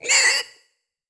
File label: Squeal; Monsters University; Archie